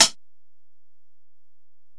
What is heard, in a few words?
Hi Hat